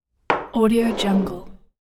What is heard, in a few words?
glass sliding on table